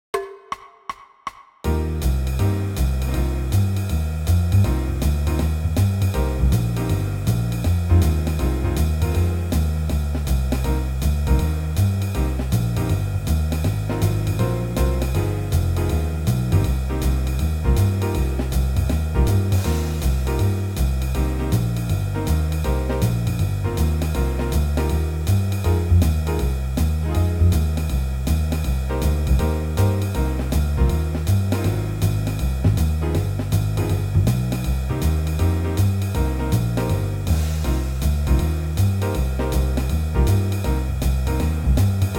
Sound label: Minor blues forma